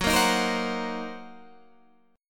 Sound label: Fsharp 6th Flat 5th down, F#6b5 chord; strummed